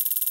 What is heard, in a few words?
count Up